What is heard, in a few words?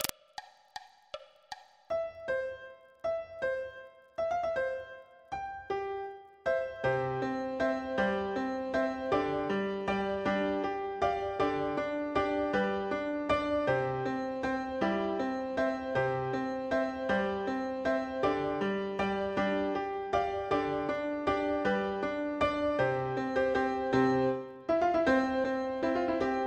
waltzing cuckoo